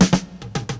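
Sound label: drum roll; E-Lab; X Static Goldmine 2; akai; ROLLS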